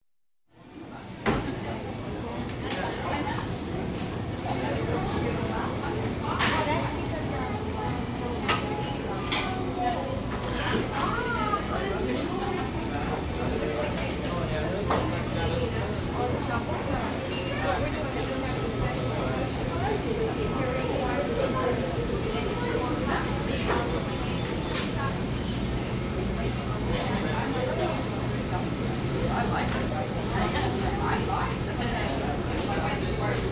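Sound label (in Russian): магазин общая атмосфера универсама покупатели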